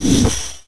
out, flare; zaero; weapons

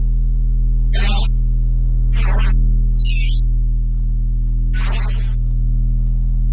comp hum; lmctf

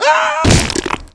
scream

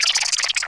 spore neutral

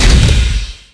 lid; xatrix; world

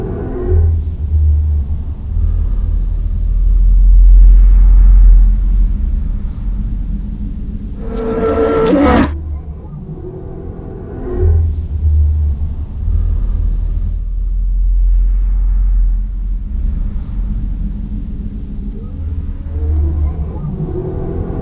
mist monster window

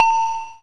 ding

